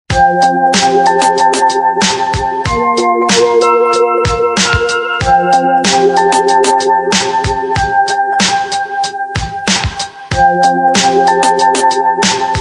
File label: Rnb